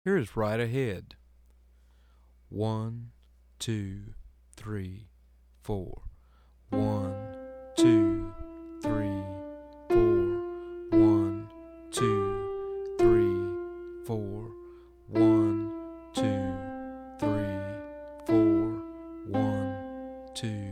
Right Ahead Slow

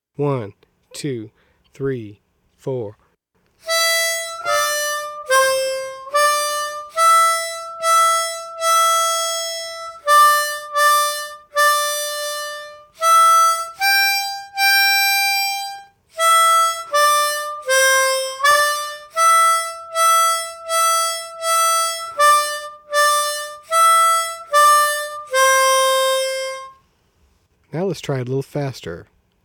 Mary Had A Little Lamb Slow

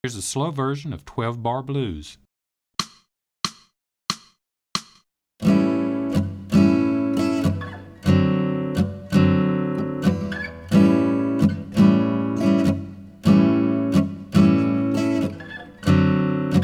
12 Bar Blues Slow

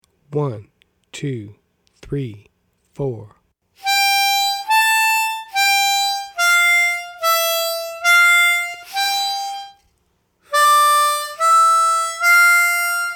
Slow

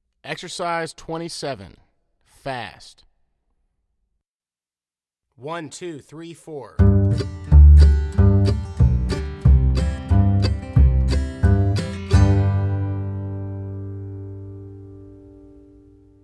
Exercise Fast

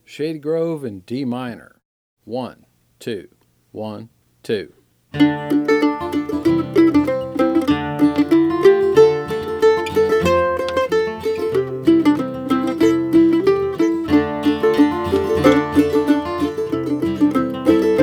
Shady Grove Key Of Dm

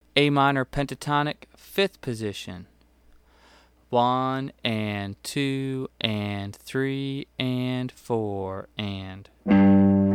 Am Pentatonic 5th Position

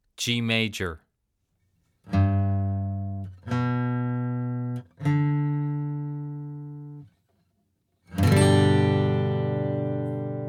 G Major Chord

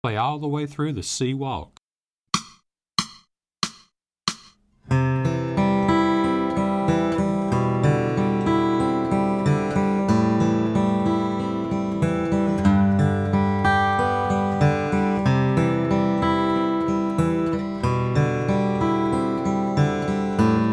C Walk

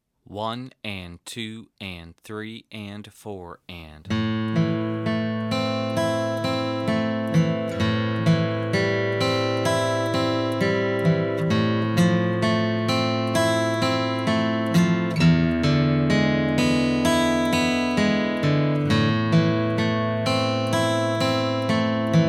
Arpeggios In A Minor

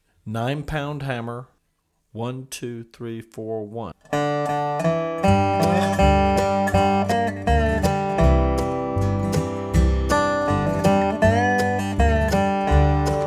Nine Pound Hammer Fast

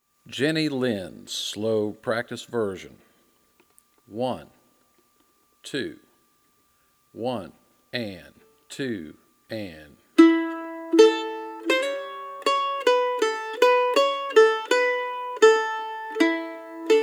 Jenny Lynn Practice Version

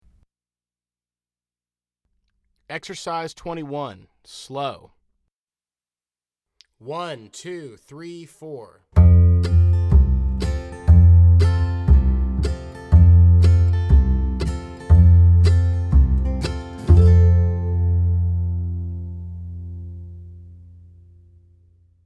Slow

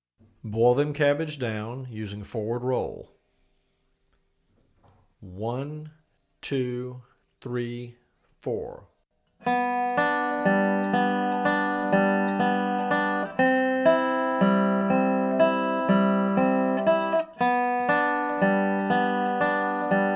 Forward Roll Slow; Boil Them Cabbage Down

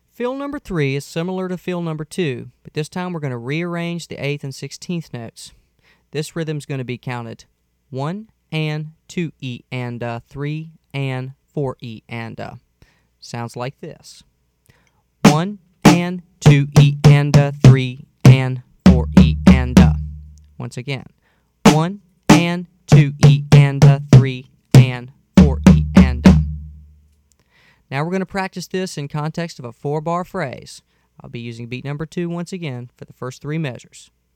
Fill

